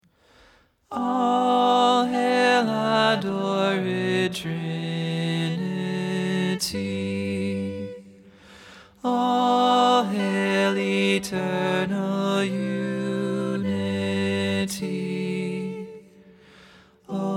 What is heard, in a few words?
Tenor Prominent, vocal